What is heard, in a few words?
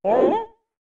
Attack; Goron Link